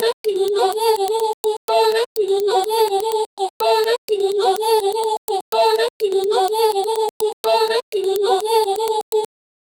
fast paced chopped vocals, 125bpm

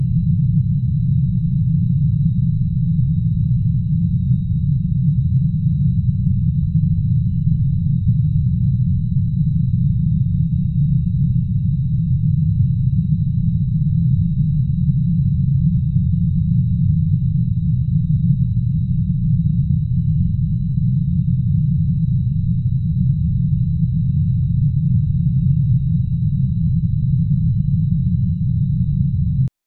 cavern sound, looped

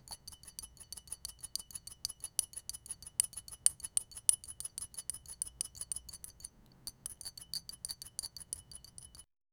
soft tapping of a spoon; spices

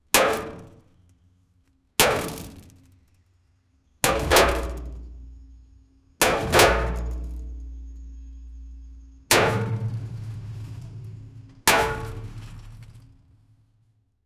powerful hit to metal; trailer

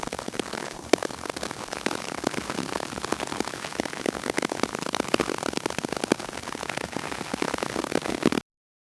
snow crunching under boot; winter

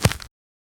short dry pickup sound, farming

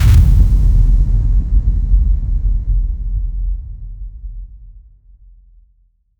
impact

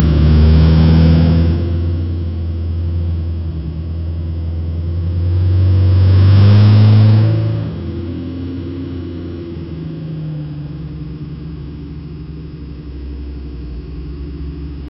car motor roaring; automobiles

